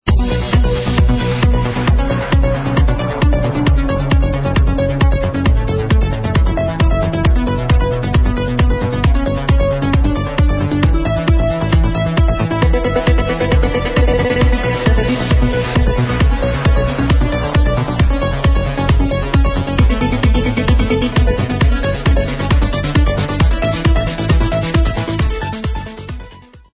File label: ringtone; آهنگ تلفن همراه ، تولید اختصاصی تیم ساپورت صوتی فیس آوا